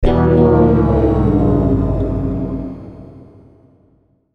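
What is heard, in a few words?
Musical Element, Drum Snare March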